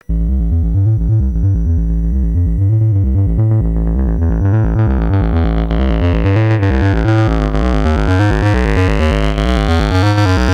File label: sid bass lo